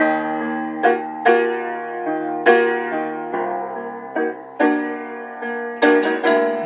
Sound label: piano, Sad